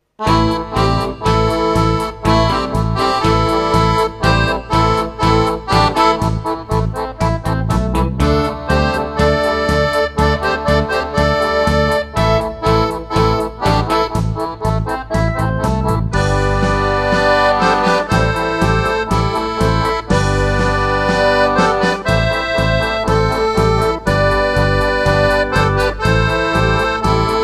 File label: Rock Café